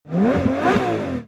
Car Engine Sound

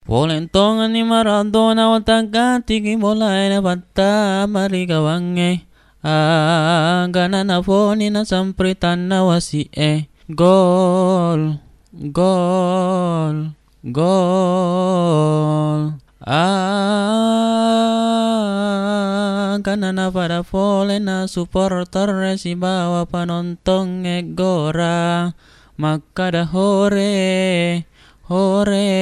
Sing

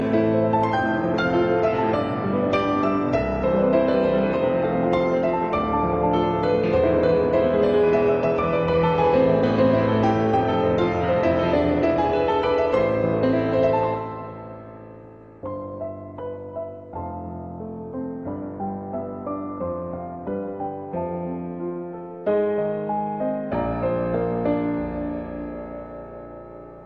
Pian

